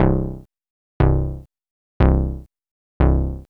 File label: Moog bass; AMG - Vince Clarke Lucky Bastard